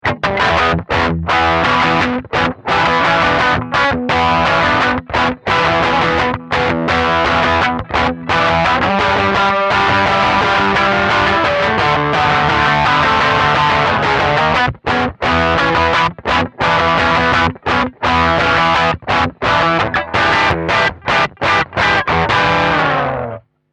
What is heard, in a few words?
Crunch